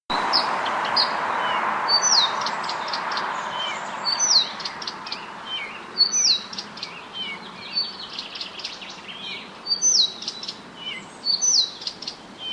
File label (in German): buschrohrsaenger